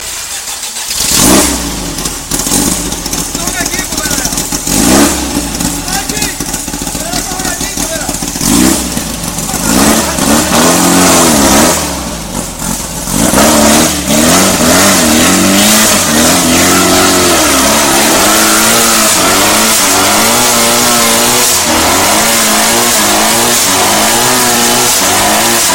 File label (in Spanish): motor plancha ringtone